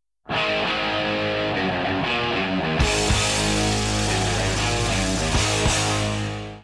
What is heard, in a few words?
cool music